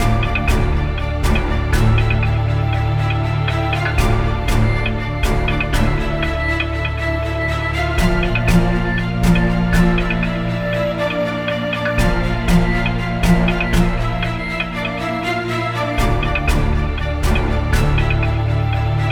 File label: Snow Level loop; Rezrog; Depard; Gems of Rezrog